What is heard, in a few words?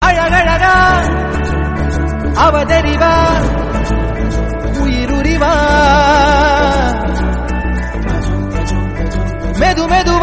Tamil, Ringtone; Manithan